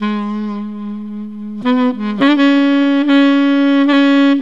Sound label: Sax Effect; Sonic Foundry (Sony Creative Software); Jazz Solos and Sections; Effected Sax